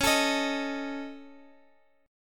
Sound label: Db Suspended 2nd Sharp 5th, Guitar, Open F; strummed; x,20,21,22,x,x; Dbsus2#5